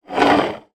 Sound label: industrial small tile scrape along hard surface quickly